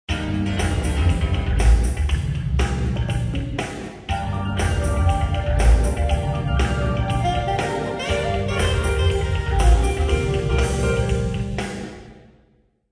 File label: music 1500ms near auditorium